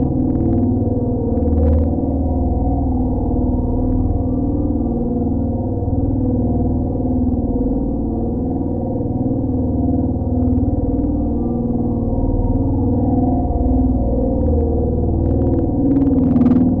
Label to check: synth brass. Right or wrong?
Wrong